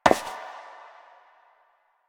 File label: Step Metal; Warframe; Koumei